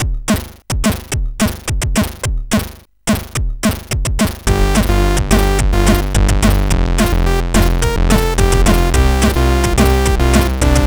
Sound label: sid CSG8580R5